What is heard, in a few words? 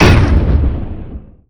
ham wave, ogre; quakemapping